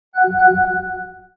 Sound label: ping